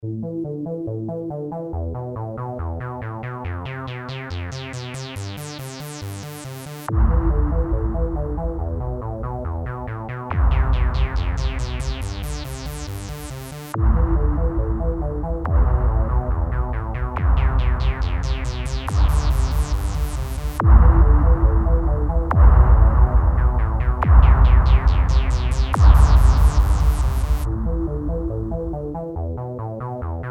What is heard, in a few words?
techno; techno fear